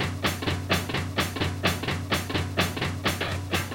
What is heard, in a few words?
Beat 128 bpm; ElectroSwing; WSL-ElectroSwing Vol 4 Additionals